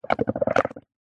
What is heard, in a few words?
nature water blowing air bubbles in bowl of water foam short burst